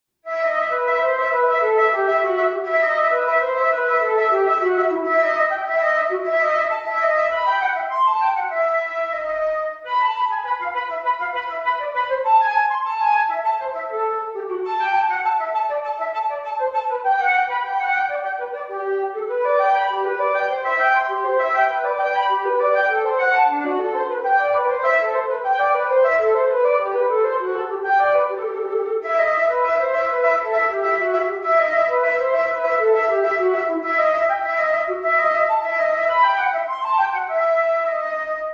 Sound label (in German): Fantasie in e-Moll